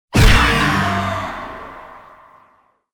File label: game ghosts kill